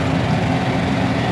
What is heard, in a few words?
Loop, Engine, golfcart